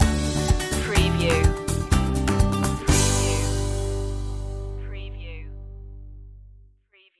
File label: bright journey stinger